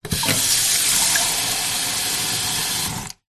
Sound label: household small metal pot fill with water from tap